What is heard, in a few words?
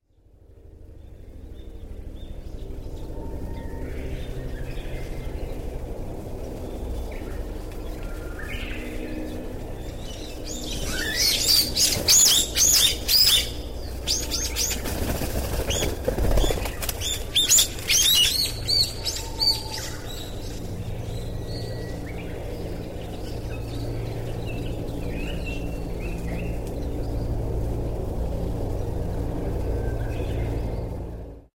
brown eared bulbul